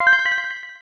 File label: Notify Message; sao utils 2 windows gamerip 2022